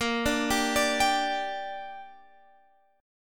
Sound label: D Suspended 4th Sharp 5th Bb